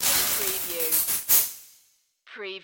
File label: Digital Hit Reverb; Space / Science Fiction (Sci-FI)